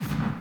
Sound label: BD Gate FX, Bassdrums; Vengeance Samples